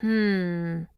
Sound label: hmm; tressa